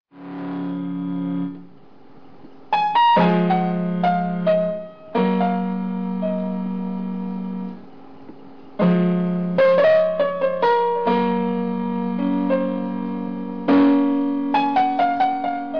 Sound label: piano